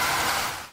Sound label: ice